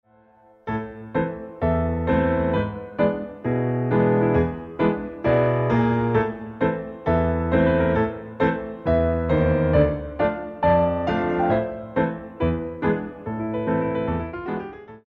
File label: Grand battement fast